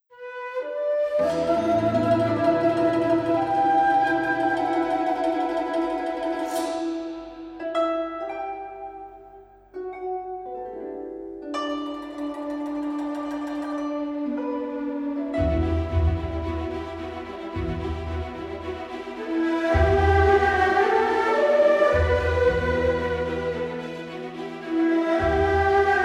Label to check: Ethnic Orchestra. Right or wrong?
Right